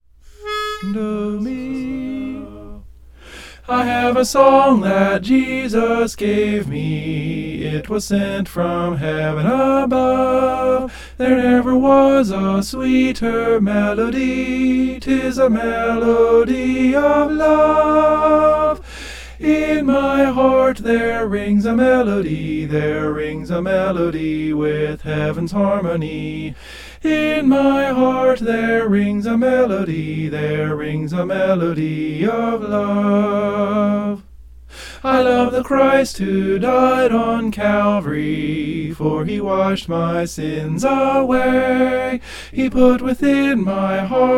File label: soprano; in my heart there